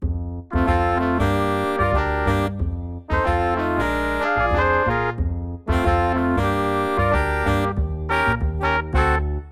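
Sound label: bridge, minor-key agressive bossa; first chorus of exchanges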